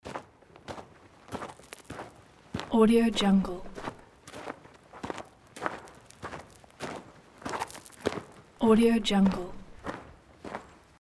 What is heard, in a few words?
footsteps walking on gravel loop